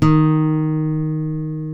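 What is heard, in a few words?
D#2 FLAMENCO, FLAMENCO guitar; AKAI S6000 CD-ROM; WorldSounds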